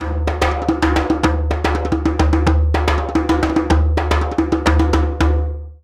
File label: Djembe; Smartisan; Smartisan OS 6.0.0; alarms